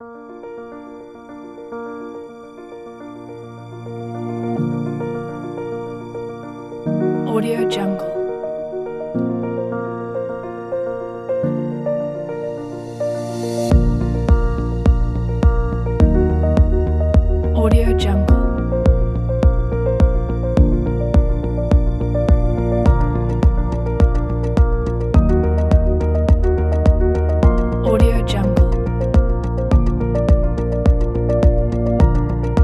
the ambient inspirational

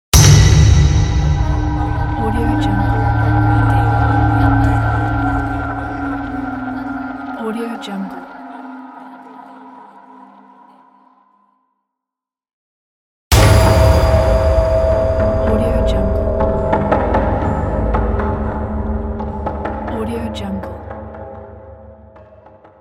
scary horror stinger

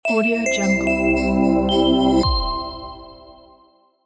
christmas logo

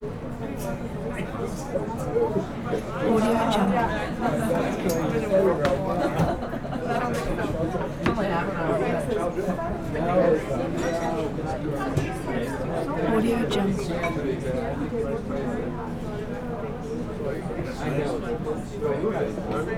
bar walla; harvard gardens; prosoundfx

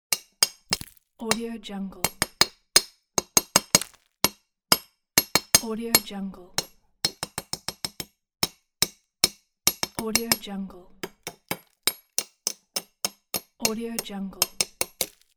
sculpting

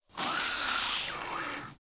creature vocal spider sucking hiss

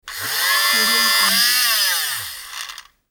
short coffee whisking; ldj-audio

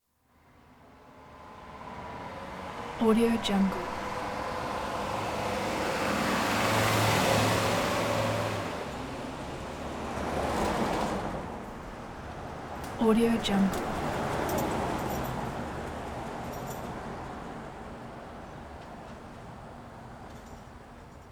vehicle pass bys med speed

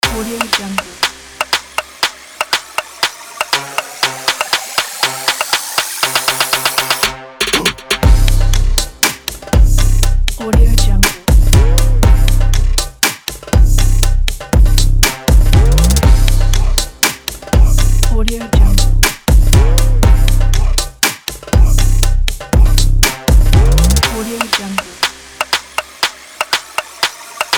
rnb

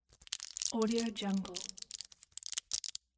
tablet blister bending crackle; mountainaudio

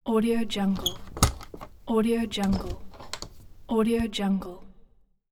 door lock opening

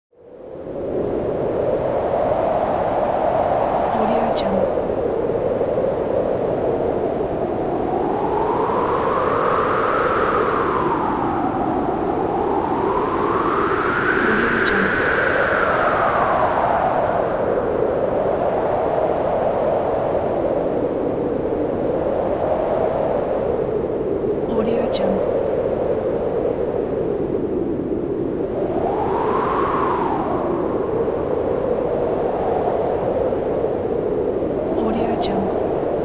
strong wind effect

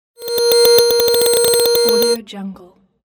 scan

